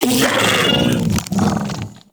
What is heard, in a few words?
Bug Vocal Death; Characters; Warframe; Hunting Critters; InfKDriveBug